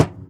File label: Ticket Counter Perc